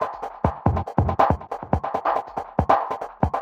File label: TECHNO EXTRA 140